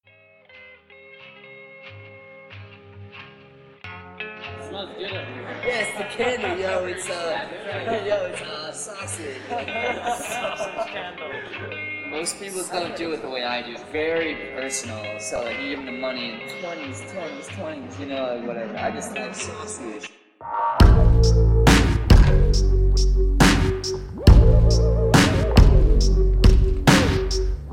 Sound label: slowed reverb